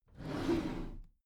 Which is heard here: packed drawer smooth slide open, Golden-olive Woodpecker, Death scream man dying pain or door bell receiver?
packed drawer smooth slide open